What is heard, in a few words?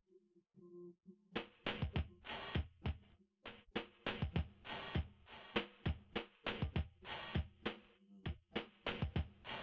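with drums, instantaneous mixture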